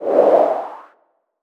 float

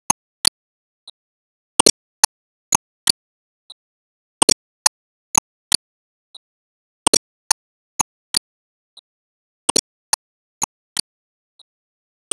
Expansionball Loop

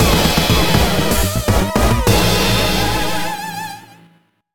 Jingle Mission failed; Chousoujuu Mecha MG; nintendo ds gamerip

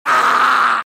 Monster Yell

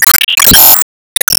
Item Get

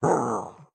Chop Wind Up; Caffiend

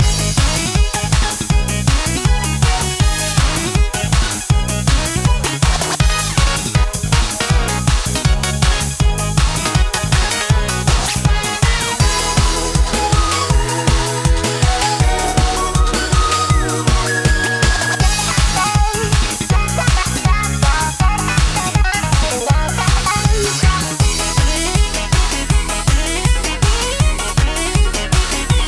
Kart Racing Nuclear Plant Mix Main Loop

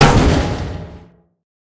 Collision Maximum; lethal company

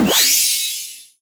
Enemy Road mechanic Throw Ball

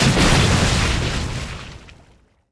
Acid ball Burst

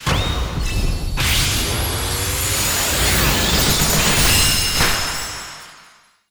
Se Gacha Card Get Special

